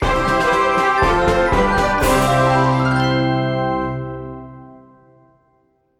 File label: level Up; MQ2